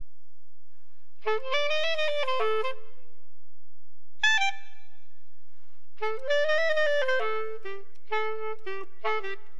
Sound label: CLARNT, Wind, AcidJazz Funk; LiveAct Pool 1